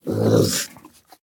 Death

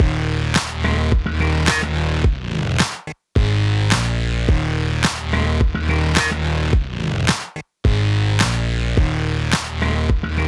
Music Frontend

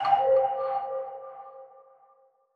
Marimba; Special-Photo Impossible-Triangle

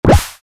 environment water splash

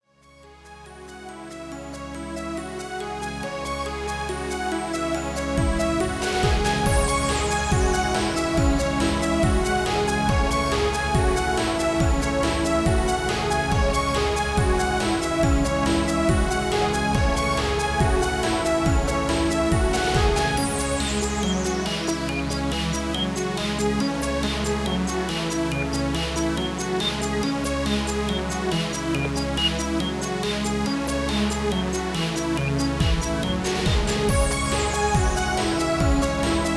Gameover; Touhou Jitsuzaisou Dream Logical World

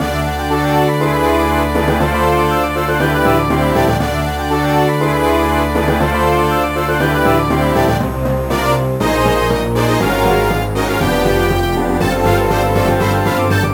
BGM OPENING; BGM OPENINGDEMO

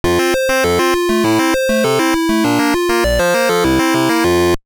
Ending; Donkey Kong Jr; Atari 7800

